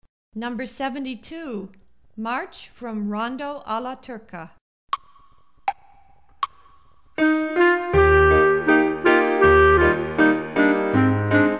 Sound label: march; rondo alla turca